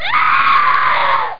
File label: scream girl; DungeonExplorer